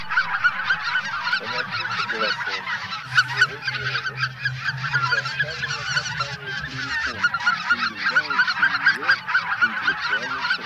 Белолобый базар Oche Lombardelle

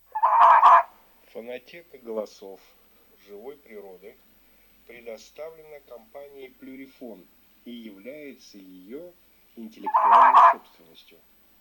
Лиса самка Volpe femmina; plurifon

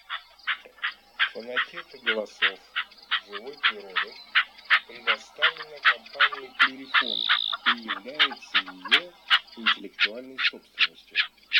Кеклик Chukar Pernice; plurifon

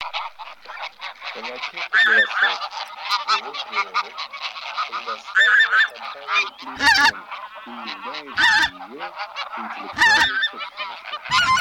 Гуменник Oca Granaiola Mix

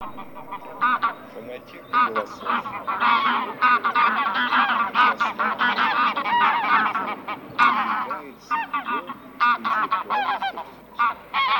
Гуменник Oca Granaiola

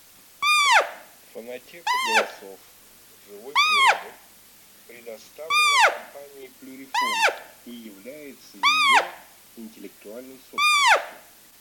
Косуля самка Capriolo; Capriolo f

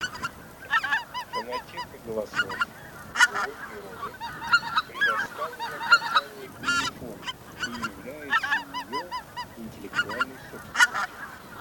Белолобый базар Oche Lombardelle

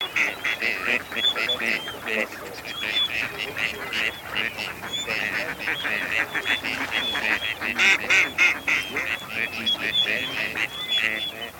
Серая утка Canapiglia; plurifon

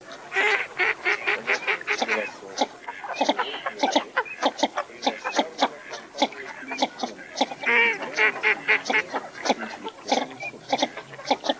Широконоска Mestolone; plurifon

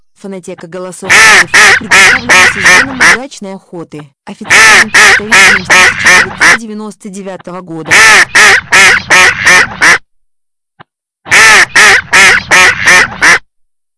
Кряква

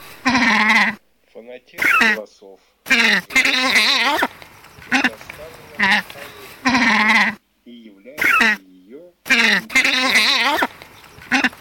Турпан утка Orco marino dagli occhiali

